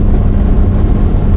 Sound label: arrow; Mafie 1